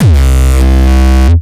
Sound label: kick; shock. Ultimate Kicks vol. 2